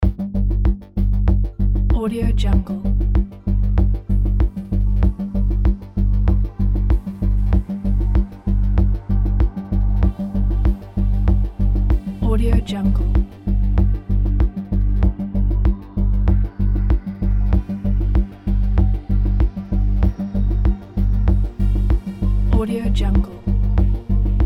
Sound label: countdown to live stream